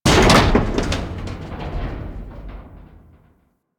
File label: Ship Hull Impact Medium; Hit Effects; Warframe; Railjack Hull Hit Effects